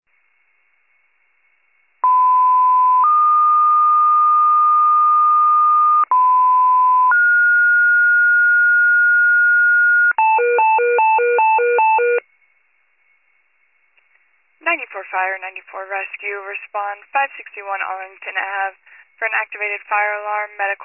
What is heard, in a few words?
FIRE pager alert